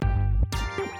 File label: phaser